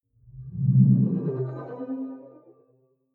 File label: sci-fi display transmission damped